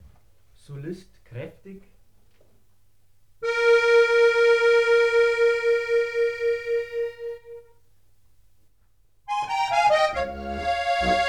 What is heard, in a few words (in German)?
Tremolos, Solist kräftig